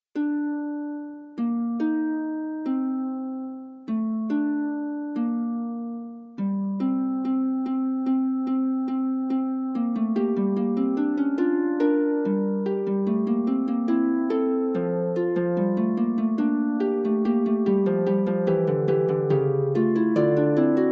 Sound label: Fugue, harp; Forty Eight Preludes and Fugues Book 2; prelude and fugue no 16 in g minor book 2